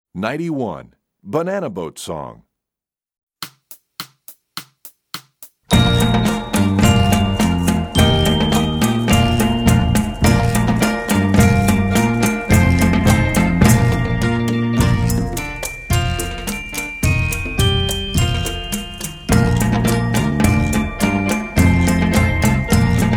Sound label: Percussion; Banana Boat Song; EE2000 Book1